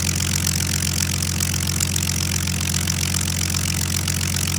Plane P40 Idle Loop; Airplanes

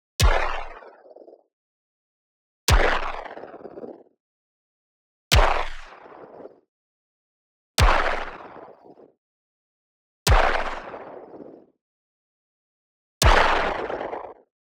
IMPACT WHITE NOISE Crunchy Thud Small; boom library; Anime Essentials

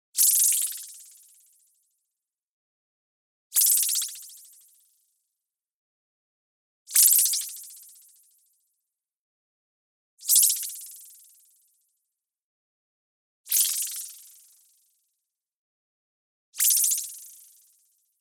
SHIMMER Knife Scrape Stutter; boom library; Anime Essentials; DSGNMisc

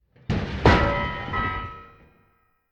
IMPACT Metal Large Beam; stereo; IMPACTS; Universal Sound FX

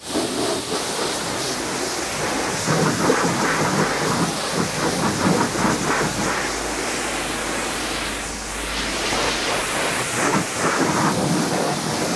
car wash hand; best service Blue Box

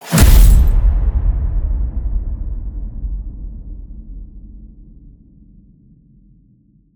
HIT Metal Crash, Cinematic Hits Designed

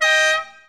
Aston Martin Horn; Automobiles

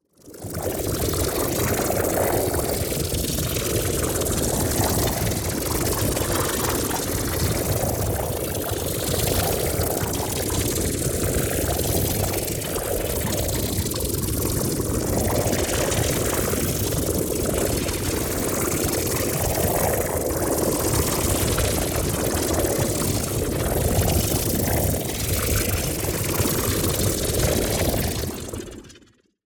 ABILITY CHARGE Bubbling Space; boom library; Matter

